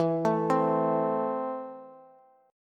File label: E Suspended 4th Sharp 5th, Bass; arpeggiated; JGuitar